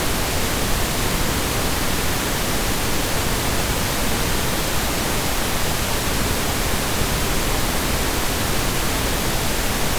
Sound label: Pink Noise Independent Channels